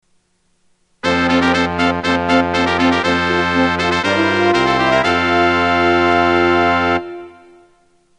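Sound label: opening fanfare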